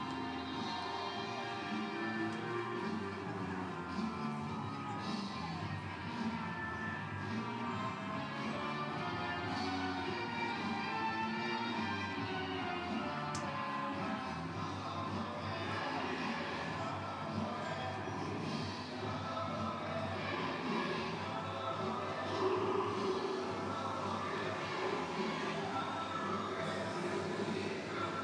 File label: music still blaring